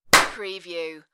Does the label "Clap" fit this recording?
Yes